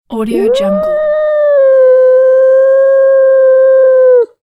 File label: cat yowl; catsoundstudio